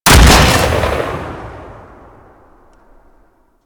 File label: Flak Cannon Fire; Grineer